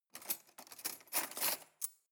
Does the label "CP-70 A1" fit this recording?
No